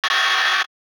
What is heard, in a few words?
robotic arm short; soundscrate